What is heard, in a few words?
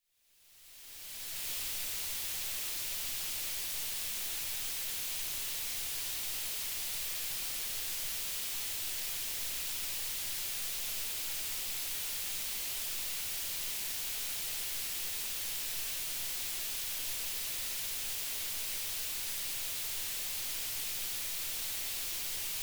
pink noise diff; group test loudspeaker cables 2013; vodoo ultralinear